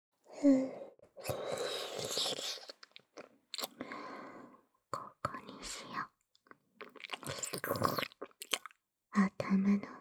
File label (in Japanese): 頭上舐め